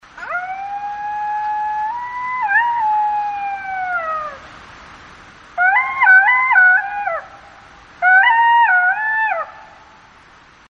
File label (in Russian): voy shakala